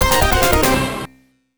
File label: You Failed! 1 (Bonus Stage Failed 1); Shadow Dancer; arcade